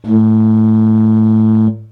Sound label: horn